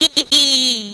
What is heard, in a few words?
laughing